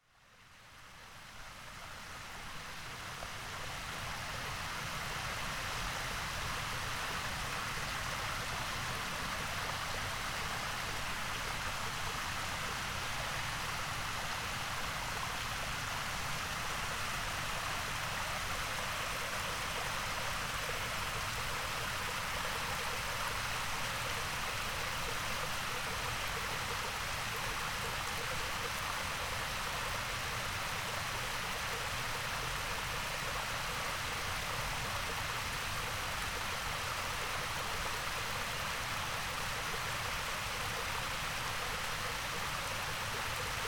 Barrage; Roche-Talamie

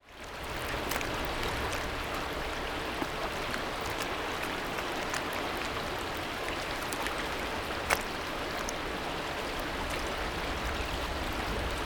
Vaguelettes; Cedric Peyronnet

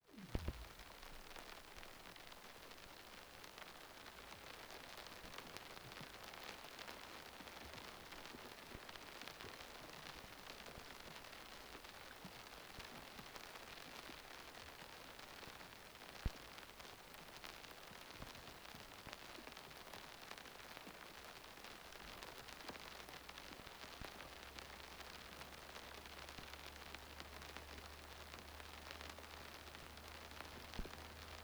Pont Du Dognon Neige Et Glace

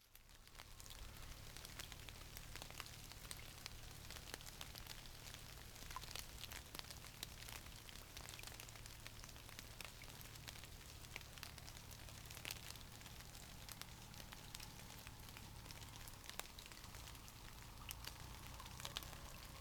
Lac de la Vaugelade

